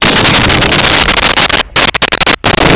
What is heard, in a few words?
explo gas